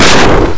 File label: glock fire